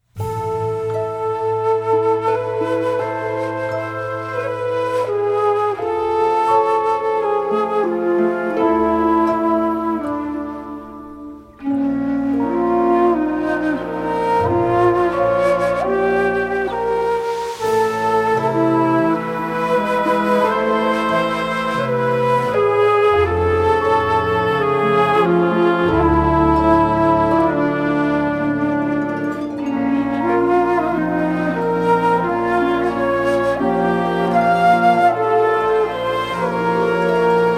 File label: Siren Song; Tiragarde Sound; Battle for Azeroth